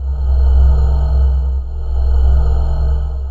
Sci-fi Cloak Loop; SFX Kit; Sci-Fi Loops

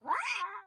hit; wilkins; Blind Drive

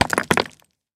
Rock

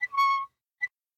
Metal squeak; blindside

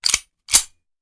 Hand Gun Foley; Sound Ideas; Guns

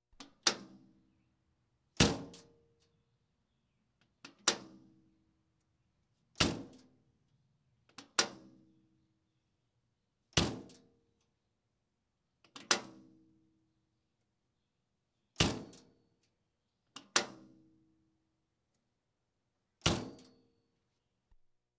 Police, Fire engines

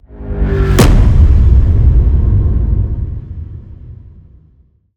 Ice Spell Barrier Defense Frost Ward; Arctic Aura

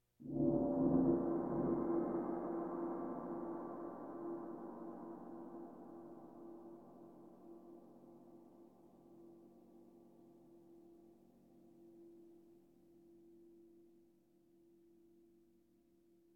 Percussion Bongos Tympani rolls Gongs Drums

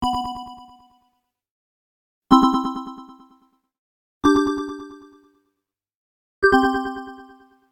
Arcade Click Neutral; boom library; The Interface

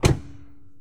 Lamborghini Door Close, Automobiles

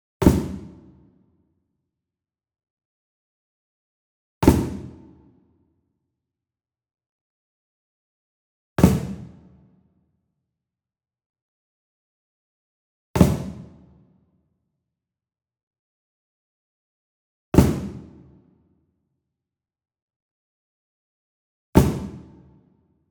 Wood Percussion Cajon Flam Coconut Beater Medium; boom library; Cinematic Strikes Construction Kit

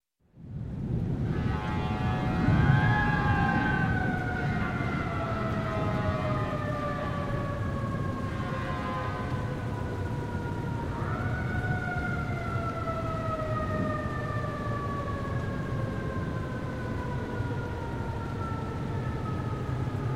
Squeak Plastic Plexiglass Fast Squeaking; Sound Ideas 2000 Series

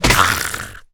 zombie hit; DeadFright

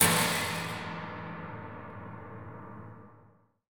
Light Bash, Cinematic Metal Impacts

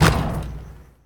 Street Ball Dunk Nylon Wood, Street Basketball; SFX Kit

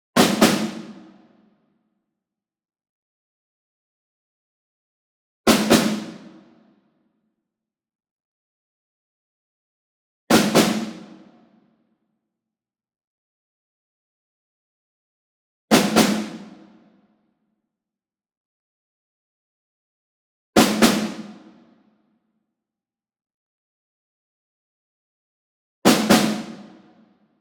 ENSEMBLE SNARE Group Double Medium; boom library; Cinematic Strikes Construction Kit

